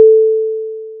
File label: ping; lange-nacht-2009